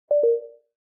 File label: suspend; ublue os; bazzite